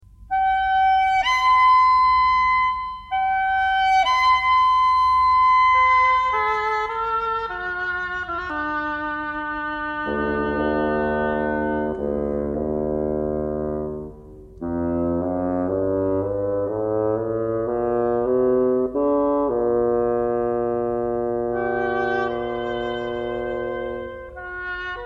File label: Dialogues for Three, for oboe, clarinet and bassoon